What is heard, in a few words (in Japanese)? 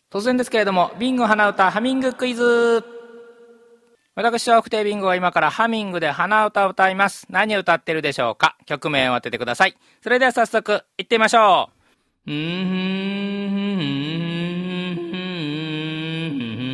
hanauta